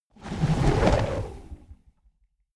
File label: whoosh flutter